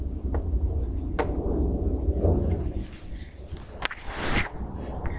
knock

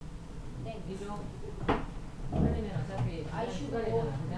strike

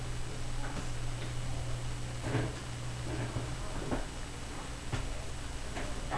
sweep

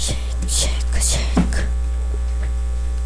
shake

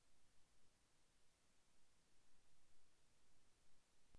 horn

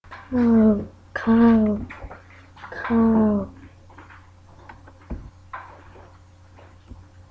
cow

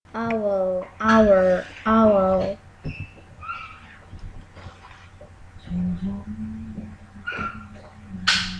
owl

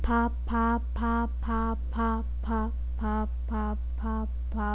pop

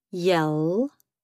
yell

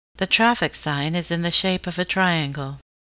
triangle

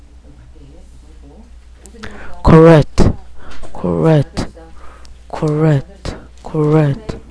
correct

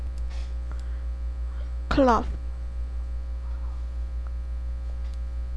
cloth

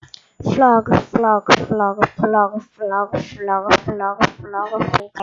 frog

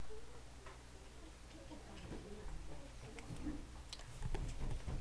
correct

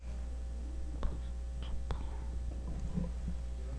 bowl

